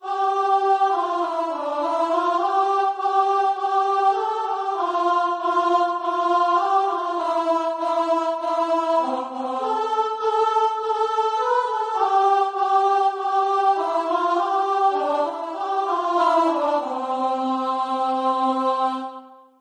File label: atirei o pau ao gato VOICE